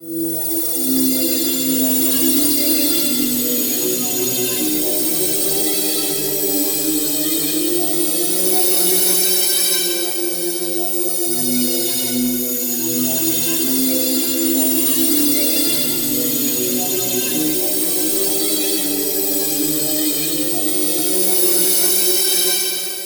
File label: Dark Intro